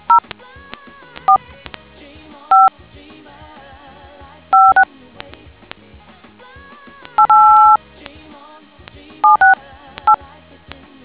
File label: dtmf